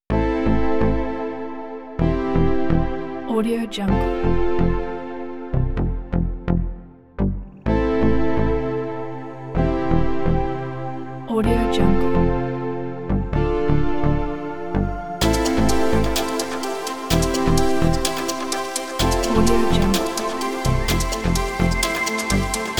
retro synth; prettycoolmusic

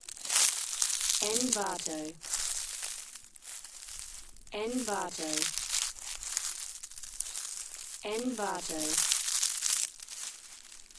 crunching leaves close proximity

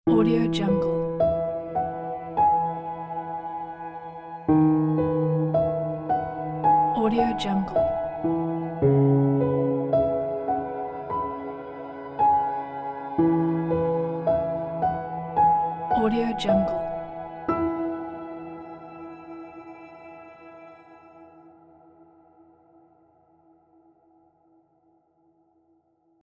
cinematic piano ident

